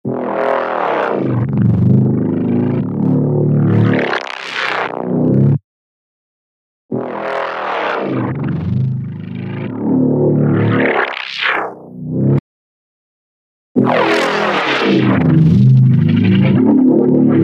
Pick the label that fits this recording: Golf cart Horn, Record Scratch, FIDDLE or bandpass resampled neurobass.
bandpass resampled neurobass